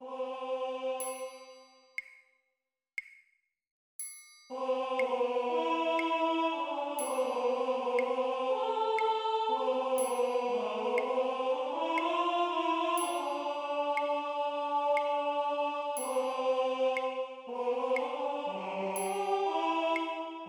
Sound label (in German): kantate Tenore; kantate-lobe-den-herren; BWV 0137